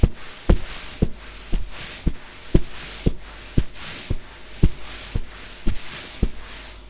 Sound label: scuff steps